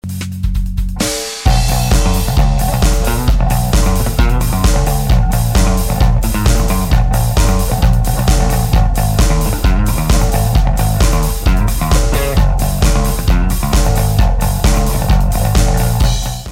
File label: chromatic bass